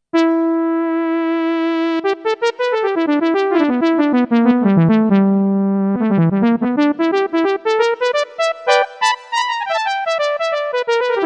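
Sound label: Prophet Horn On Blofeld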